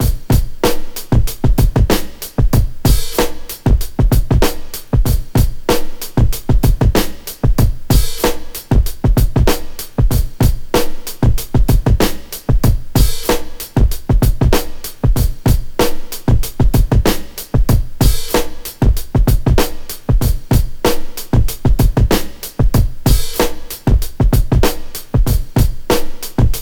95 Old Skool, Breaks; Lockdown Breaks

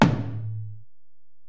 Tom, Alesis DM-PRO

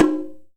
SHIME, JAPANESE PRC

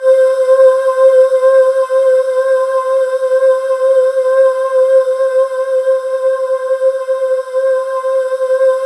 C5, Synth Leads; 90 s Sample CDs; NiceBeats (NiceBytes); Studioline 09

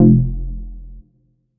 bass classic room F; Polyend; ARC Noise

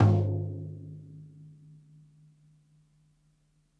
ROOMY TOM SOFT; BASEMENT DRUMS VOL 2

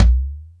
House Machine, Drum Machines

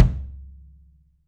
KIDM, 3D Drums, MAL KICK

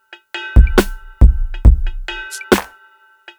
Drums, Cinematic Funk Urban; Sonic Foundry (Sony Creative Software); Cinematic Funk Urban Tone Poems

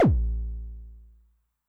BD MOD [Pitch+Depth], 909mod, BASS DRUM

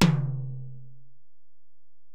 Tom, Alesis DM-PRO, Drum Machines, Toms

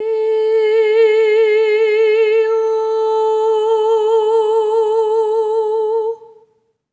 EH OH A4, SOP; Spectrasonics; Symphony of Voices

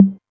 808 Tom Hi, Novation Drumstation; Novation DrumstationV1

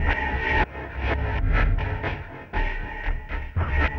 Elektro Brood Cym, drums; Sonic Foundry (Sony Creative Software); Friendly Fire

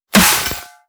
snare broken fridge door

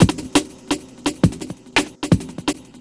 Beat, Futurist Drum & Bass; Drums & Percussion; Beats

